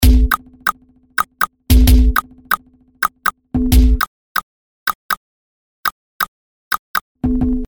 BEAT LINGUINHA

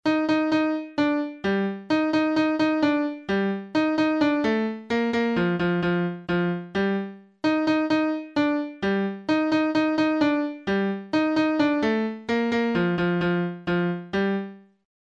melodia

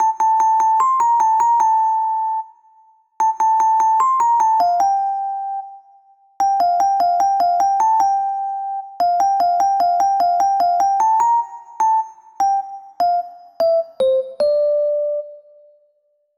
melodia

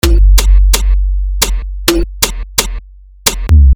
beat

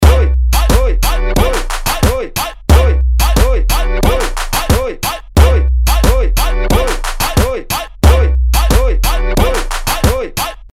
BEAT RITMO MEXICANO

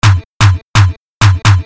beat

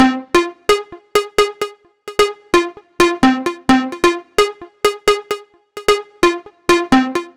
BEAT MONTAGEM

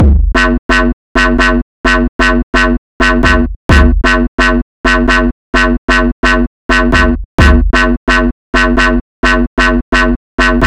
BEAT

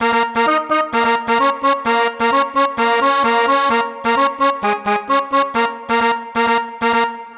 PONTINHO MADEIRA

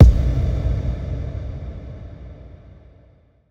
CAIXA DE ENTRADA

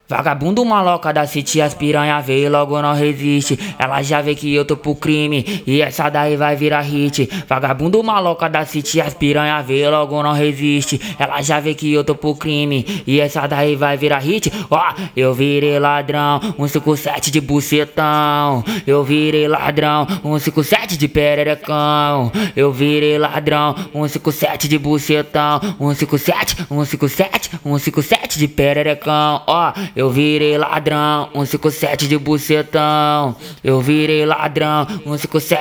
ACAPELLAS EFEITO

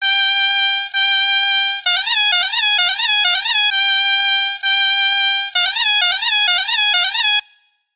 PONTO GAITA

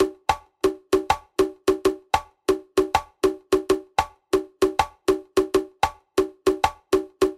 atabaque antigo; renovado

